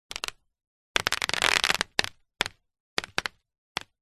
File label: nature water drips sudden short paper sheet